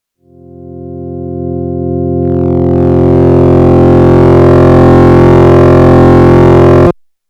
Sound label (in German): Verzerrung; Fusionbox